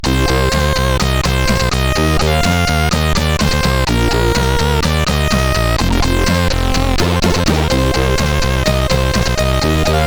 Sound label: Test, CSG8580R5